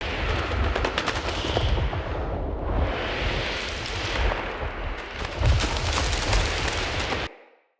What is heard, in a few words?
Fey Flock Loop; Powers